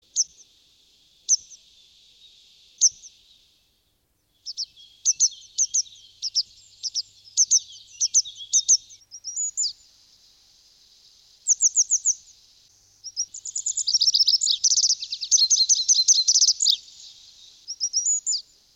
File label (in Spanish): Lavandera cascadena